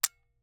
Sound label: Camera, Aimex SP500, point n shoot, plastic, snapshot; Eiravaein Works; Vaeyan IV